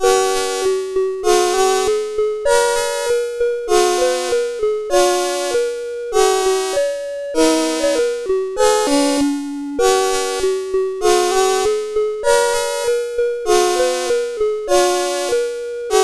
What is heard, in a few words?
sid CSG8580R5